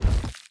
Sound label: Giant Hopper Jump